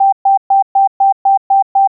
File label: PARK SENSOR; SIMUZENSOUND